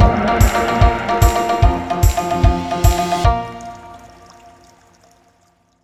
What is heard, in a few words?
win Large; netent